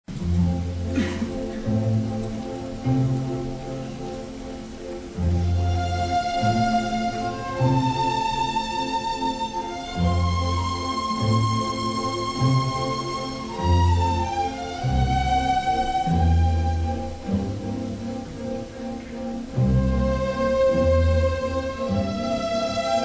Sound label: Piano Concerto in C, Andante